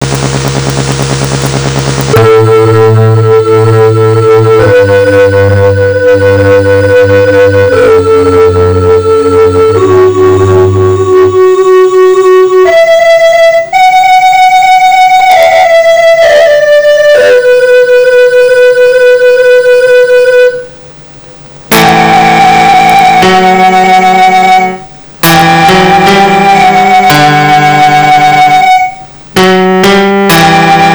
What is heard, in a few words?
concerto